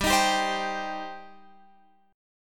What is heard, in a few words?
G Suspended 4th Sharp 5th, Guitar, Open F; Gsus4#5 strummed; x,14,15,14,15,14